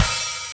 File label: OPHAT; East West; Funky Ass Loops